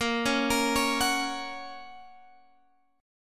A sharp Minor Sharp 5th, Guitar, Open G

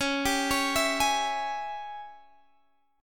Ab Major 7th Suspended 4th Sharp 5th Db

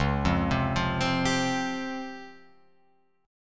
C sharp Major 7th Flat 5th Guitar Open F; C#M7b5; arpeggiated

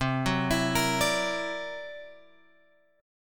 C 9th Flat 5th C; C9b5 Chord {8 9 X 9 11 10} arpeggiated

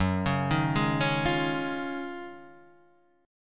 D sharp Minor 11th F sharp, chord

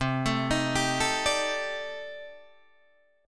C Minor 6th Add 9th, C, down, Guitar, DADGAD

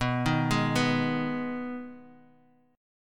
D sharp Suspended 4th Sharp 5th B

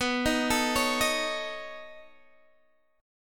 B 9th B down Guitar DADGAD

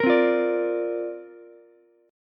C 9th Suspended 4th Ukulele C6; strummed; C9sus4; 15,12,13,17

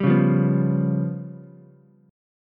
Db 7th Flat 5th, Ukulele; Db7b5 strummed; JGuitar

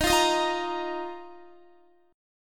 D sharp Suspended 2nd, Guitar; D#sus2; strummed; x,18,17,20,17,18

